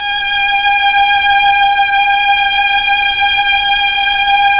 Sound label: STR, Melo. Strings, Slow Strings